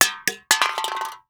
Soda Can Kick; Crashes

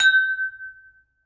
Glasses Hit and Ring; Crashes

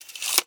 Tape Measure Close; Tools; 1001 Sound Effects

